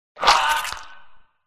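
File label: undead boss attack voice middle; umertvie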